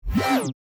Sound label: chime